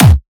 normal hit whistle; osu

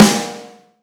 soft hit clap; osu

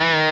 bend half release

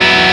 F#m7(11); osu

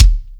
drum hit whistle

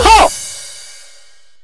soft hit finish; osu

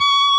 solo Left-hand vibrato

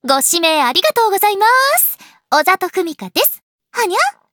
applause; osu

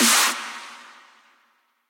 snare

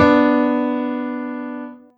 Two B3 D4; osu

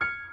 piano high

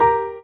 Drop Lead; osu

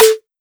normal hit clap; osu

